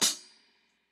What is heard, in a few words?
closed hh; eddyflux